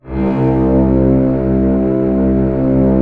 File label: orchestra C1 PP; Sound On Sound - Peter Siedleczek's Orchestra